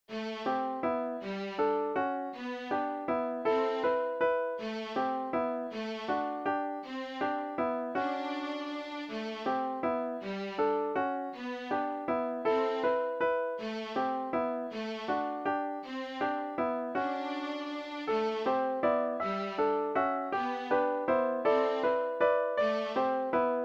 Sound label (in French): jazz sax